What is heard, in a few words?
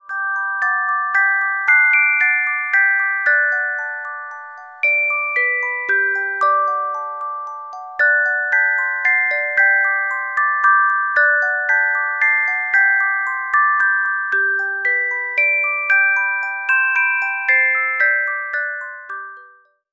Carillon Music Box Melodies